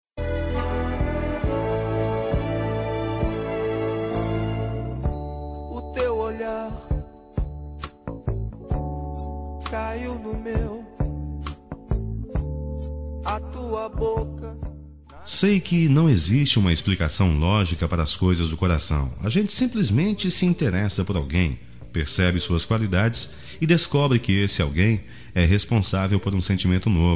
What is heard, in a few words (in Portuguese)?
Masculino; AMOR NÃO CORRESPONDIDO MASCULINO